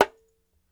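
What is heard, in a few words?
Large Dumbek Mute, World Percussion One Shots; Sony CS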